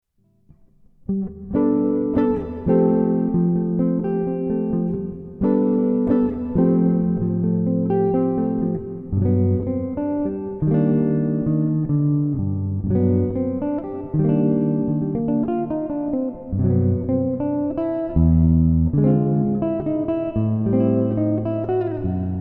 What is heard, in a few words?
Bossa Rocka